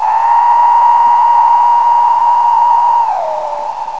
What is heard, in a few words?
whistle; match